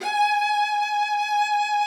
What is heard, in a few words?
CELLO VIB G#5; Xsample VOL-7